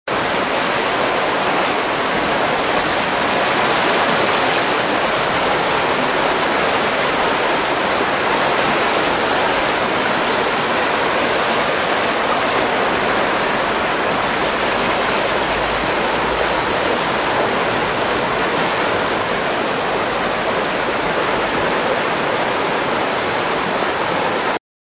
donaustrudel

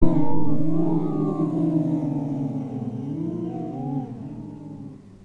gespenster

